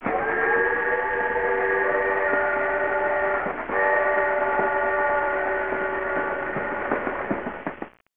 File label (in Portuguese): Vapor Distante; locos